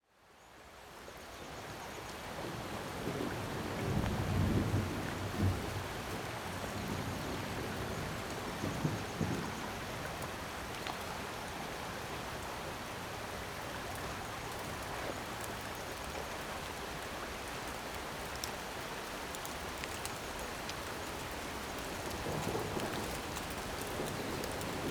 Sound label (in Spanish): lluvia bosque rio arbol; o que arde